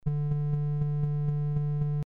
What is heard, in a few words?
dpcm